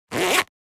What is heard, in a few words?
Coin pocket zipper wallet; foley; Wallet and Purse